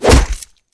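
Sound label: Caiman attack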